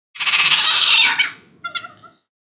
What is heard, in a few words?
Otter Sea